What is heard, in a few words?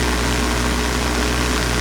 Machine Generator Loop